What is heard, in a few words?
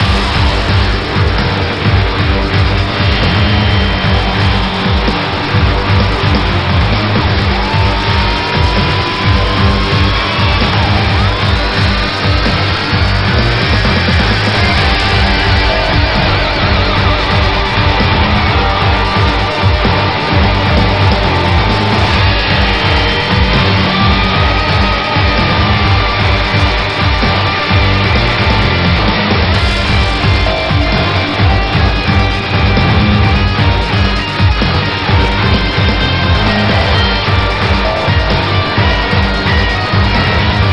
battle, boss; boss yihuVSlanran; bleach mobile 3d